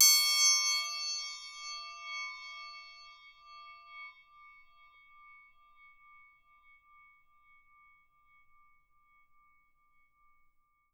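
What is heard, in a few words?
SM TRI P right, percussion; Miroslav Vitous - Percussion & Harp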